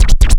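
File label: dj scratch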